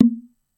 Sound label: Plastic Bottle Pop